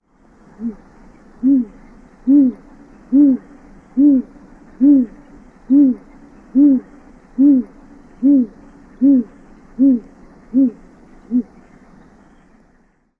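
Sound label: Great Gray Owl, hoots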